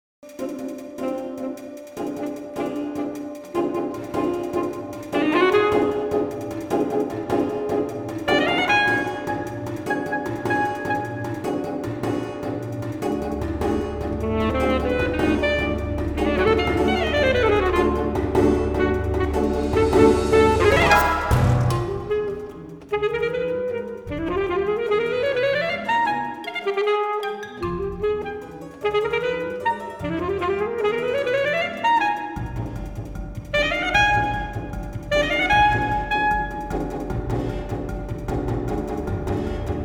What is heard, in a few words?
sax